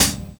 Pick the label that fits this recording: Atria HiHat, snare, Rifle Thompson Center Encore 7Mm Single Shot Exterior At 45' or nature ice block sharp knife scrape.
Atria HiHat